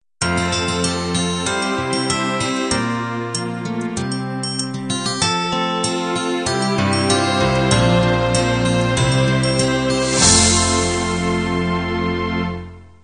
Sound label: diva end